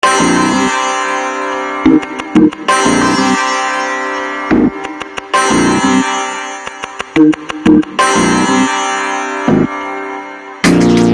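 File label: confusion drum bass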